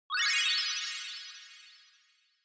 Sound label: Multi Accent Reverb